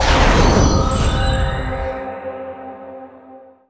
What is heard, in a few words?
power up